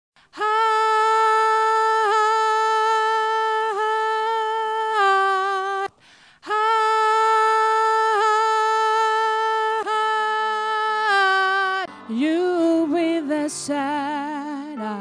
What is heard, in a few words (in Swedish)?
bas, stammor